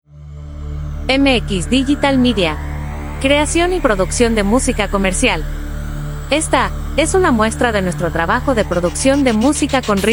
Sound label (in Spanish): jingles ritmos colombianos; MX Digital Media